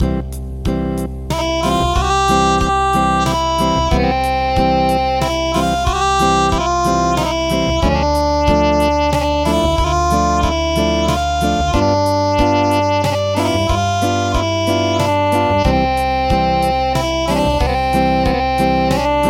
Acoustic; My Baloon

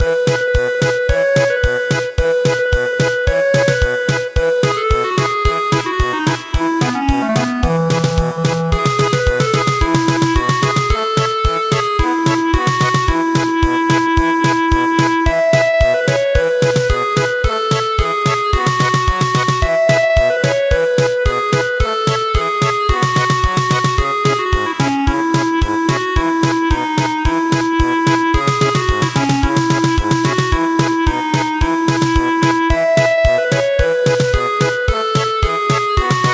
German Polka; He is born

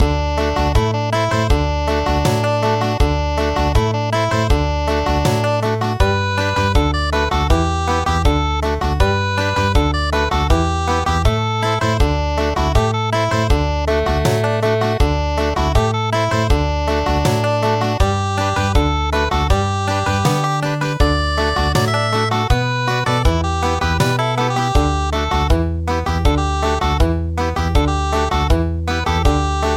madder color pass Country

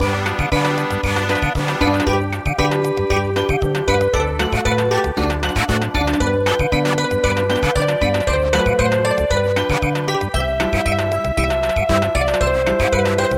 Samba, midi

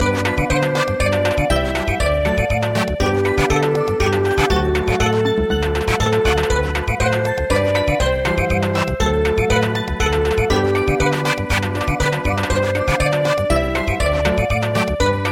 Samba; We love the heroes

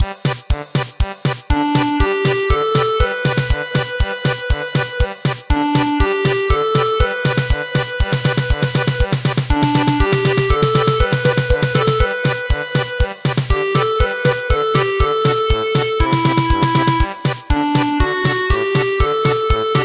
German Polka

